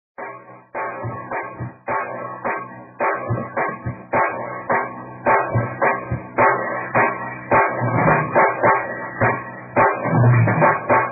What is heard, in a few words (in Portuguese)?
sopro e acompanhamento